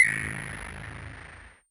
Perc

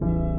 Synth

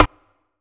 Rim

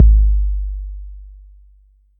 808's

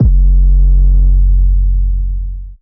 808 long clean G; 808s

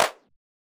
Claps

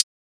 Hi-Hat; Closed Hats

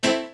piano

